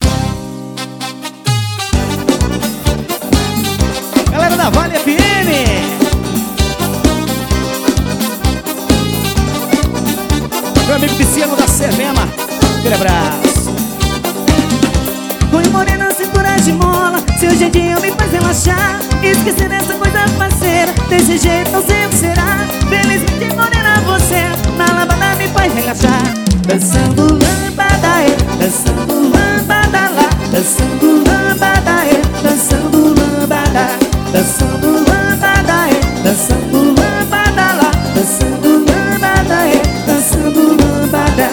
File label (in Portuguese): ao vivo, forró; em mauriti